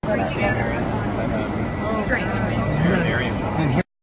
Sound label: plane fog